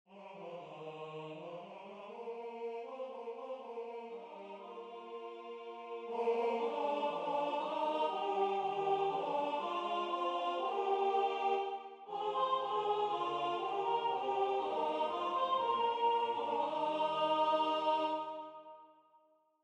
bacchanal sopr; Christmas 2026

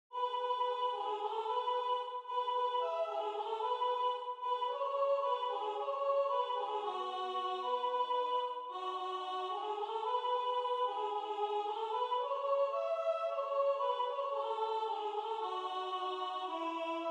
Song Of The Lark sopr; Potpourri 2025

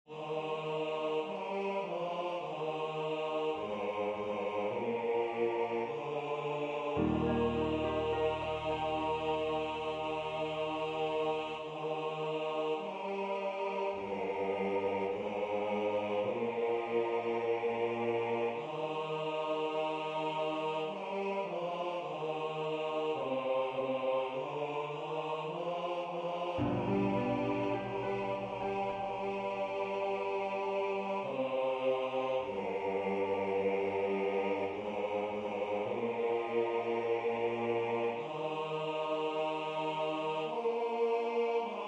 Elijah bass; Christmas 2026

